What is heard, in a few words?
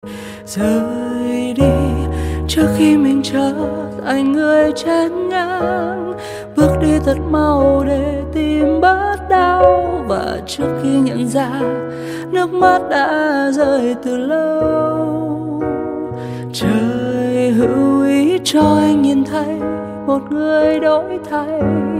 piano; piano version